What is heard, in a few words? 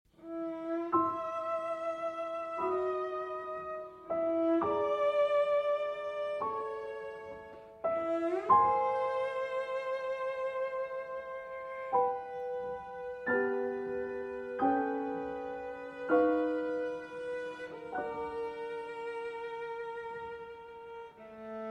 Sonata for Helen for Cello and Piano IV. Final